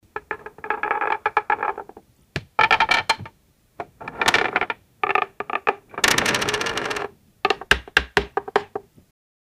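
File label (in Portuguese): efeito sonoro cinemático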